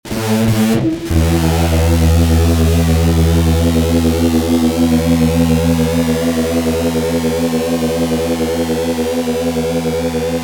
fuzz gtr polyflange

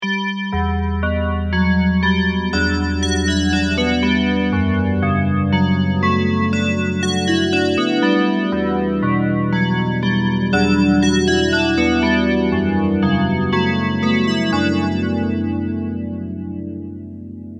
dx7 bells dimension c

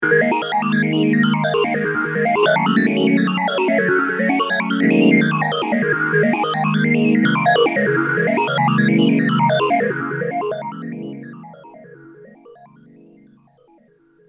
m1000 boss ph3

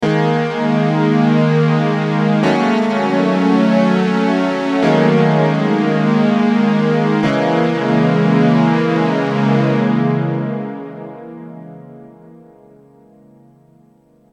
MXR FD

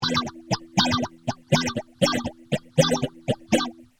rhy gtr

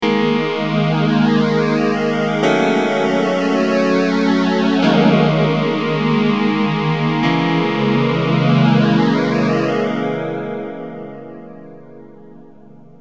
m1000 phase

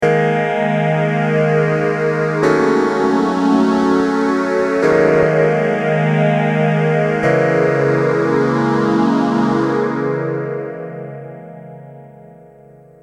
Mutron phasor

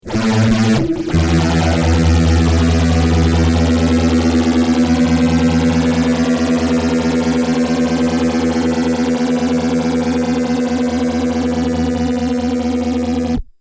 fuzz gtr

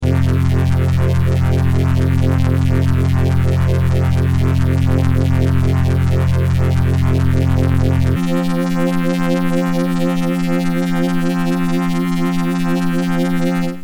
prodigy voce spin

